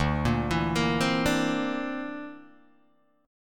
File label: D 7th Suspended 2nd Sharp 5th D, Guitar Open G